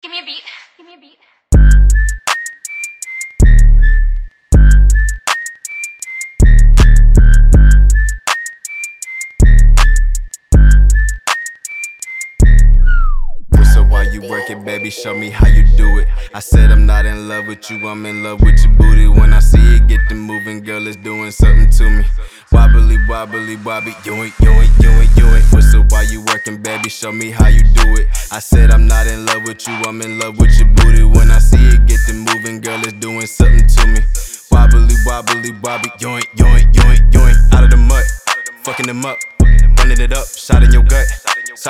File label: Whistle